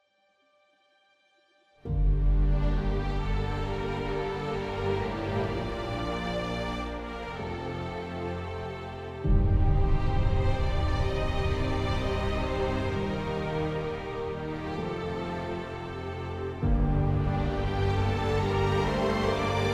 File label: String theme w atmo boom